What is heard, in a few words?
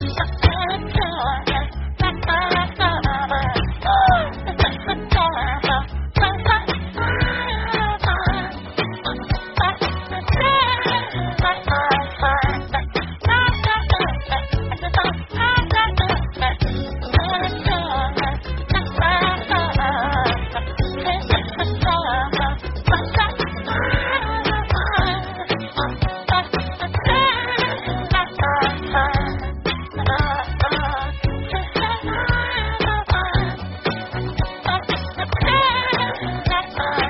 villagers chorus